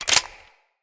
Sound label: Heavy Weapon Clip Out, Reload; Weapons